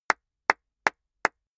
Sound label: clapping slowly; comedy-cartoon; koerpergeraeusche